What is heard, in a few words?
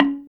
KULINTANG D4; Spectrasonics; Heart of Asia; KULINGTANG